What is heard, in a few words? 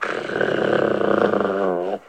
dog snarl